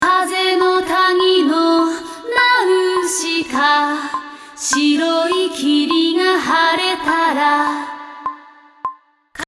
Vocal Ef Doubler

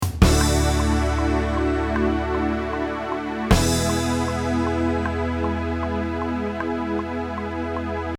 End Syn

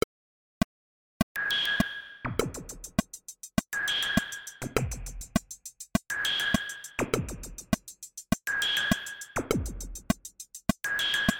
Perc

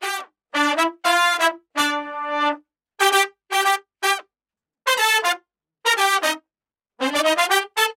B horn

